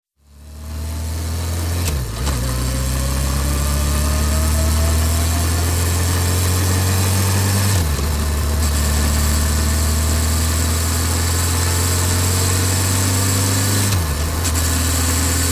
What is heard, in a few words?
Motorbike Suzuki 650 On Board Travel; Various traveling then slows to stop; Motorcycle; Tom Hutchings